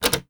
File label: Button press